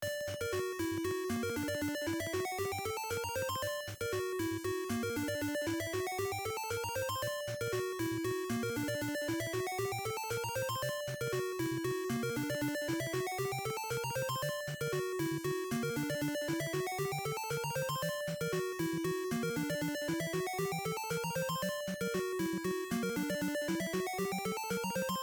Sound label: Unused Song; Shadowgate Classic